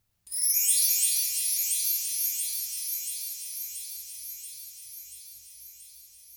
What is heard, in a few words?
Belltree Right dly